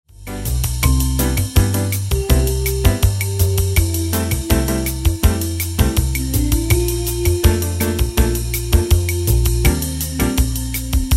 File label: cycle major 160, swing